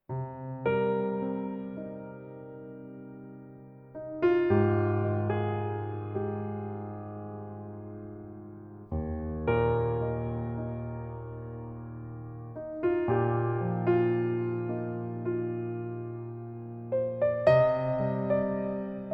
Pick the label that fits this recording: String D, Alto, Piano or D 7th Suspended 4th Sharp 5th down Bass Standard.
Piano